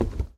Wood; Lethal Company

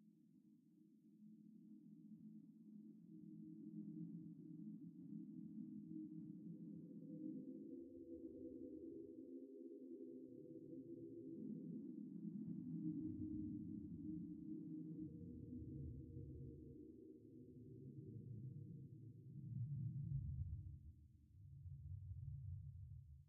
Ghostly Synth

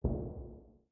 bump outside ship

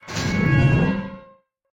garage door slide; Lethal Company

